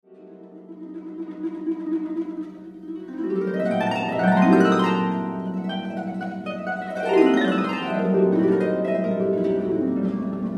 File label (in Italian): Improvviso per arpa